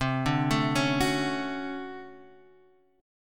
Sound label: D Major 7th, C; Dmaj7/C; arpeggiated; JGuitar